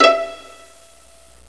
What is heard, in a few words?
VIOLIN E; MUSIC